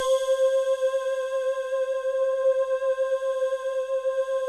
soft voices, Soft vox; Optical Media International; Sonic Images Library

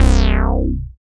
BAS MKS-50, Roland

